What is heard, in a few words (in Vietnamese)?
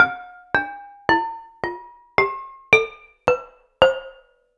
go do re mi pha sol la si do bang chai thuy tinh